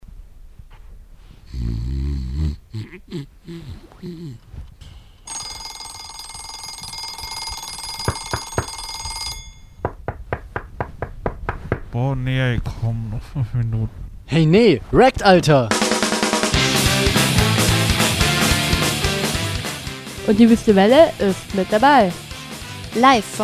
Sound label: Jingle; 2008 Zeitlos